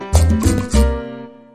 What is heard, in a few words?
Hit